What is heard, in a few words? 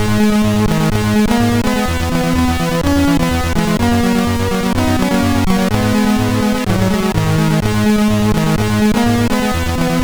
bassline intro, MOS6581; Bassline Intro 3; MOS6581R2